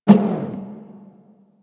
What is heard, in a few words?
Ship Turret Stop B; Player Path Beam; Fomorian